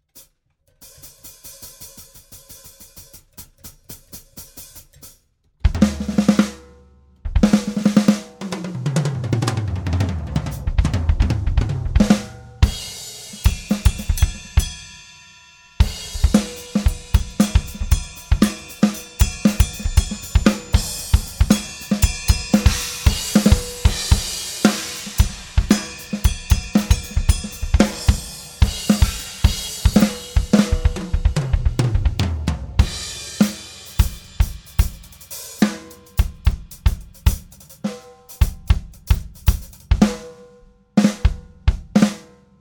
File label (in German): Soundcheck-Gedaddel Dyn ohne FX, Drums